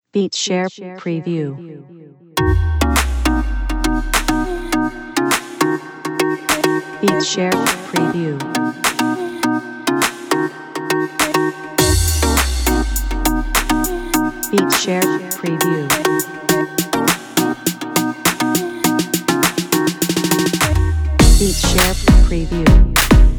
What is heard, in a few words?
urban bpm102 sample